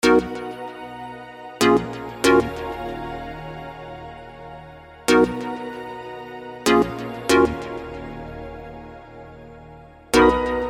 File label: 140 BPM E♭ minor